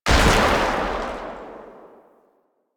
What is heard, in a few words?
Shot, Rifle; Weapons; Warframe; Thanotech; Trumna Prime